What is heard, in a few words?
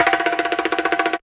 conga roll spy movie